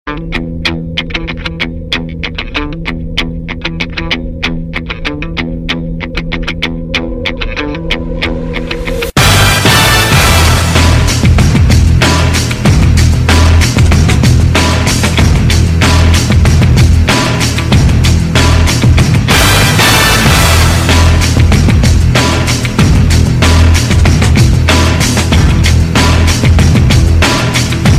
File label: Guitar Song